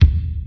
bass drum one shot e key, Royality free kick single hit tuned to the E note; Loudest frequency: 169Hz